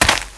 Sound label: dirt, player; svencoop